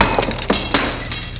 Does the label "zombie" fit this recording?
No